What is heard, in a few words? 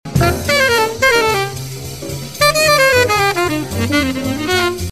line slow